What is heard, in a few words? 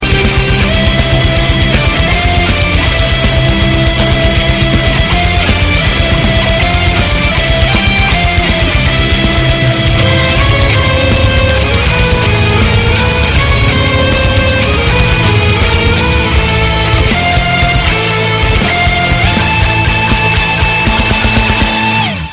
Metal; Stargate